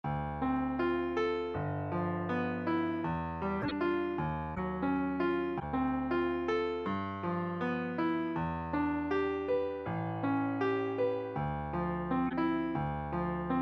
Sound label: tree arp